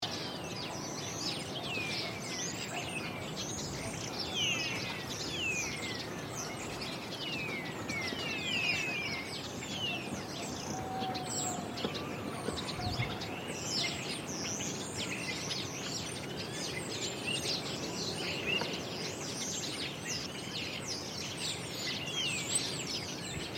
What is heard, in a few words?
Backyard garden ambience trees wind and birds